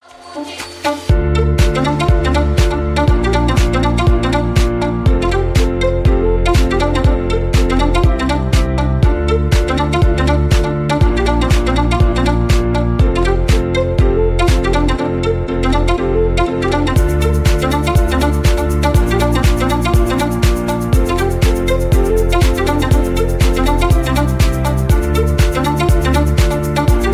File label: shuffle dance